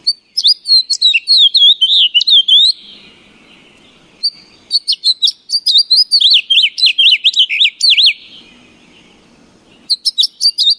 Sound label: Birds Sounds